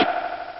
fall; mog

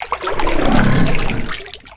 DROWN; vortex; schicken

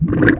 gurp

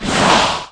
cold cast, spells

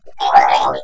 gurp

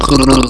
gurp; potator

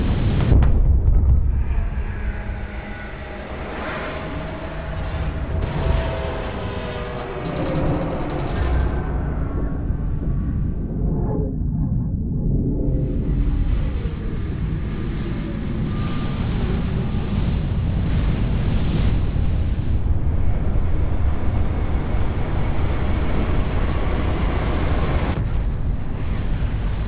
ambience; ztndm4

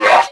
ber pain; berserk

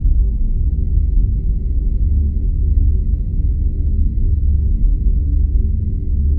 ambience

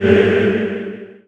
amplify damage; baseq2; curses

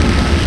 jump; boss2

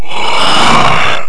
death; hydralisk

